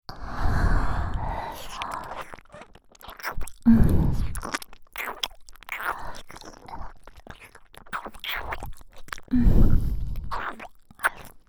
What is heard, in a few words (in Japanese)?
両耳舐め; L会長・R会長